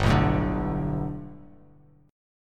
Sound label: G Major Add 9th, Bass Standard; Gadd9 strummed; JGuitar